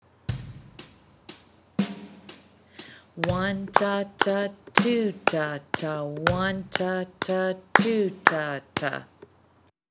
8th Notes Compound Time; Traditional

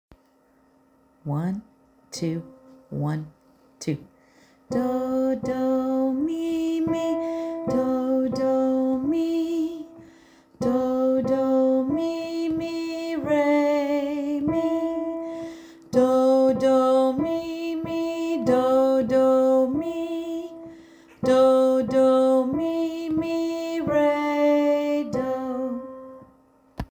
solfege

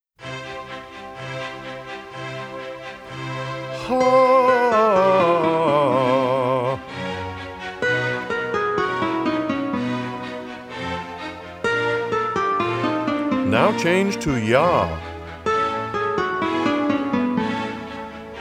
Hah Yah Hah

